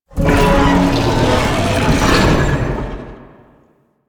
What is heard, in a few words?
Tank Infested Vocal Idle; Enemies; Barks; Warframe; Lasrian